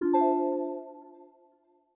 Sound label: Windows Foreground